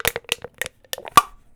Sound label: Small Can Crush; Micro Pack